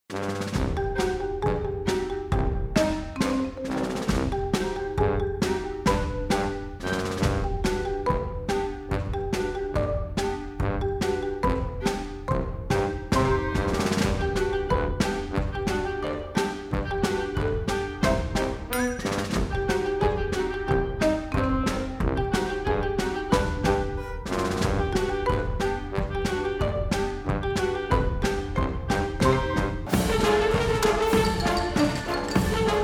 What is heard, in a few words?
Music Taking picture; Snaparazzi